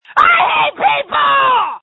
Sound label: Scream